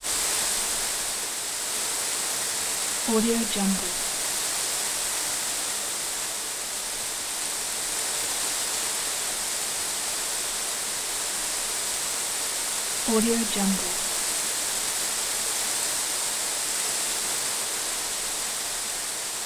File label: mechanism source steam intense constant; soundmorph